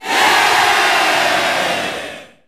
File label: SE GAME BREAK; maimai